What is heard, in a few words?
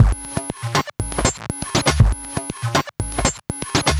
Modular Loop, Modular Percussion; Sonic Foundry (Sony Creative Software); Synchro Funk